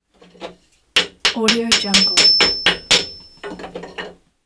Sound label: hammering nail